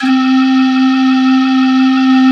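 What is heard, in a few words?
U220 calliope; Greytsounds; Scott Peers Sound Engineering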